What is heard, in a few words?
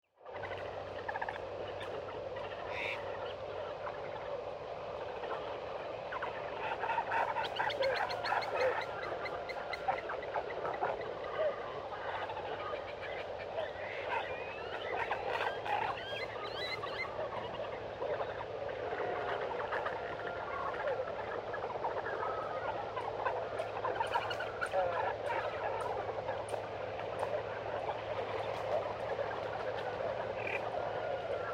Jungle Ambience